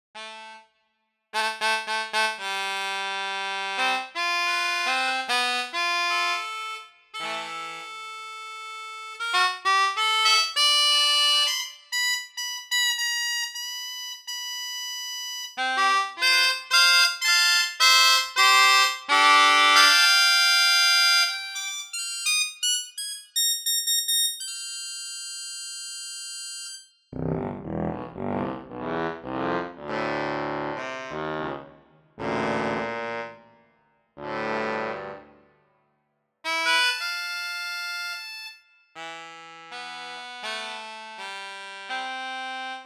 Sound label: Concert Kazoos